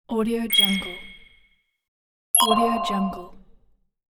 robotic button

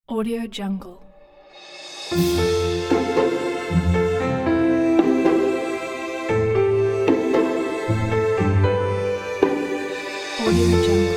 classical waltz

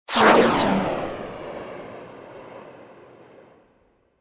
stinger missile deploy

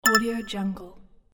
short melodic alert

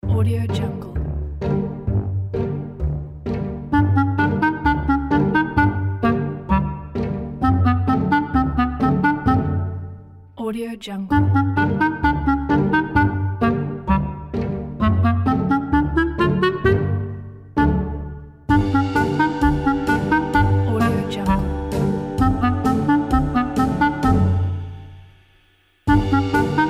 sneaky awkward comedy; pianissimo composer

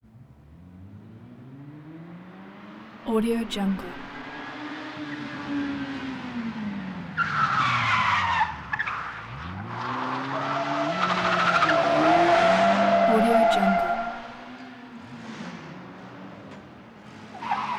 ferrari approach in fast swerve

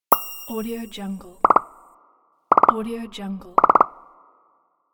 bubbles pops; olibrykov

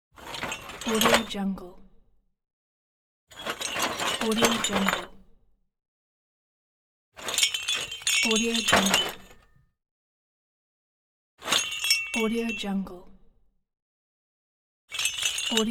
scrap metal

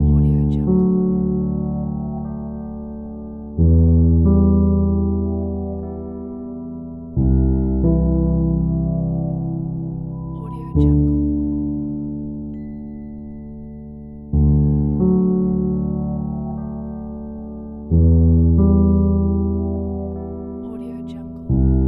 dreamy piano loop

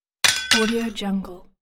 knife drop

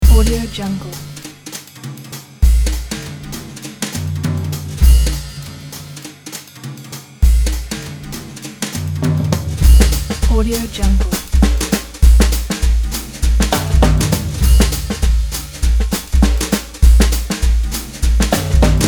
jazz brush drums

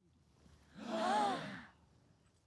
crowd reaction

